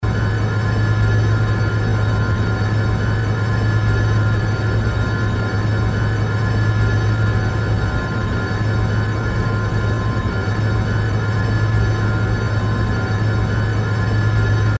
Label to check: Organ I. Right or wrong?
Wrong